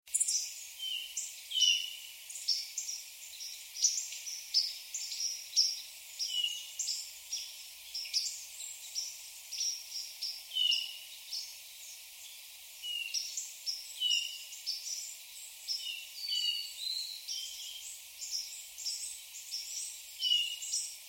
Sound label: Catharus guttatus call; passeriformes; turdidae; birds of the ussr